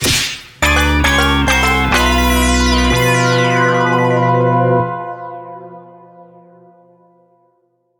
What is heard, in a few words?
Jingle Gameover